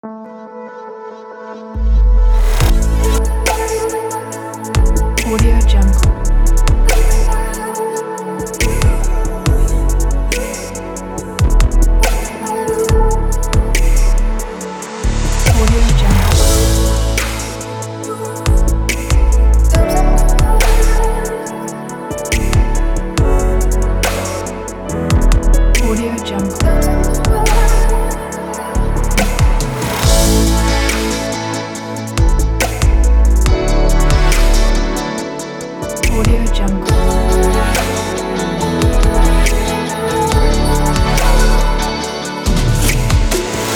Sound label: pop; scarlet pop; emilarmusicandaudio